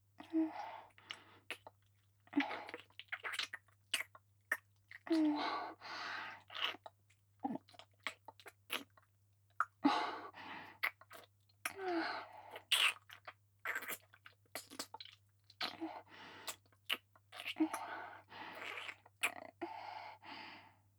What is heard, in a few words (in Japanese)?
フェラ; 性欲旺盛な陸上部の先輩後輩JK、ベロチュー青春溺愛えっちでコーチの汚ち◯ぽ生ハメ特訓♪